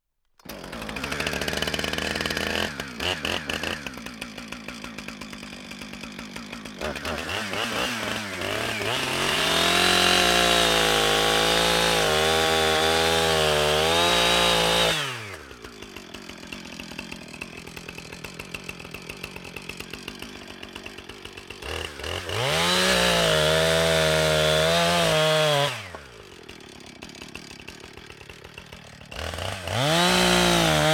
Industry Mechanical Chain Saw Start Run Cut Logs Off Petrol Motor; Power Tool; Power Tool Sound Effects